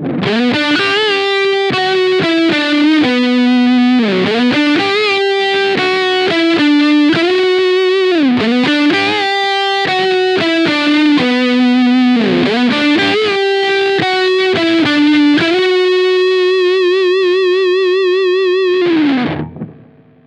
Clean Amp Tone 12 Uhr Sustain 3 Uhr

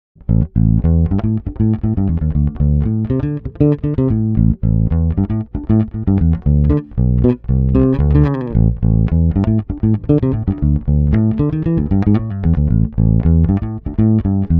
e bass fast; Dave Hill Designs Europa 1

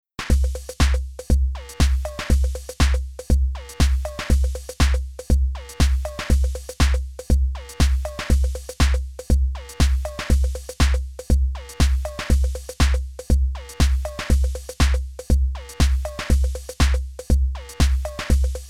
Drum Sounds ohne dbx

